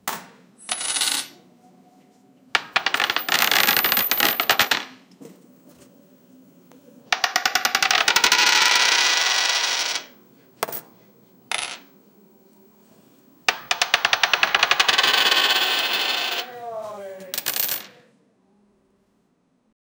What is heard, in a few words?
coin flip into the air